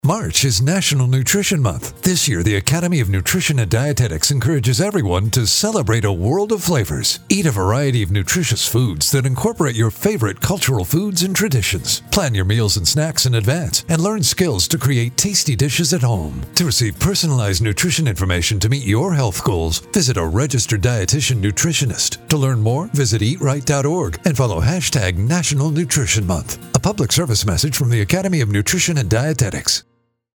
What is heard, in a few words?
psa music; NNM 30 sec psa